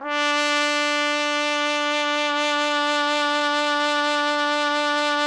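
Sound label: TPT, trumpet; Roland - LA Composer vol. 3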